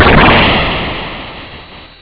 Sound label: Plant Explode